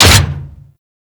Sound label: Jackal Step Metal; Warframe; Machines; Steps